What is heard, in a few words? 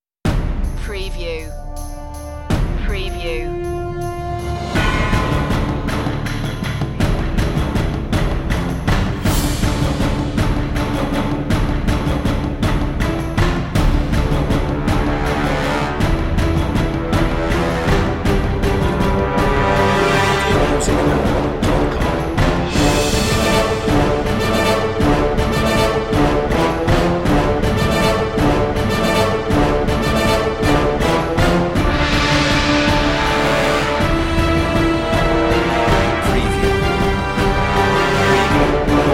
no choir